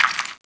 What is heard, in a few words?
rock deb, impact debris rock